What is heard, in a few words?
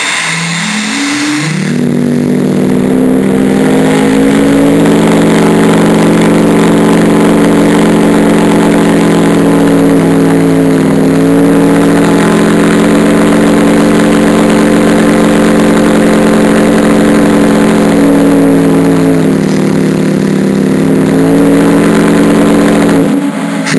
horn